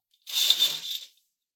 レースカーテンを開ける音; curtain

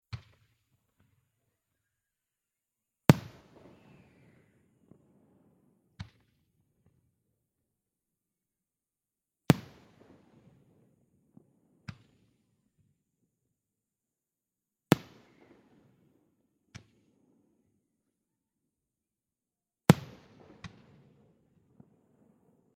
花火の音 ゆっくり; firework

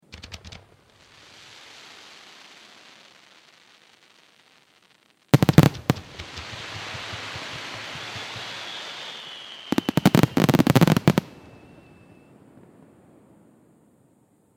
花火の音 連射; firework

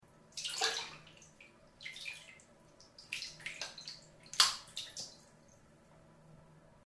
お風呂で一緒に入ってる人の腕を優しく離す音

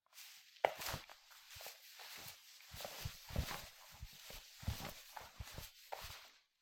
静かにフローリングを歩く

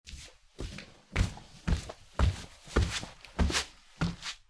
畳を歩いて近づいてくる足音; walk

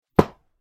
脱いだ服をシーツの置く音

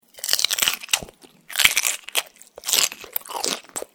ピーマンとひき肉の咀嚼音 口閉じてる

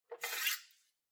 包丁を取り出す音

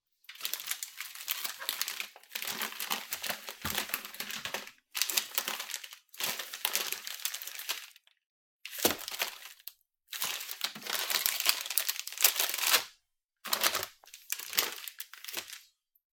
シンクの下の調味料を漁ってる音

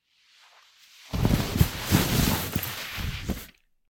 布ソファに座る音; sofa

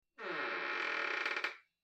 ドアがきしむ音; door

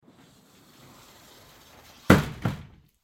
錆びれた窓を閉める音; window

